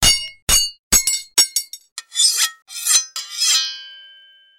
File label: sword